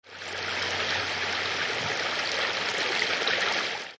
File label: falling water sounds